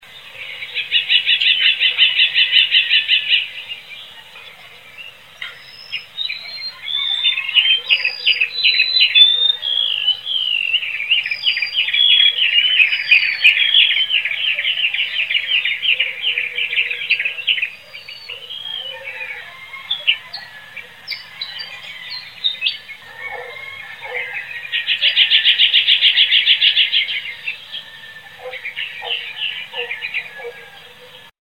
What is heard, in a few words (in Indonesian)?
suara call n song burung kapasan kemiri